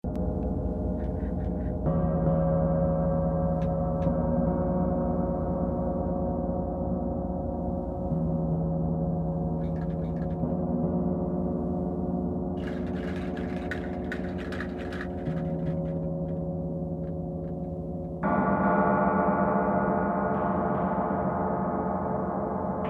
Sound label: Gong Bell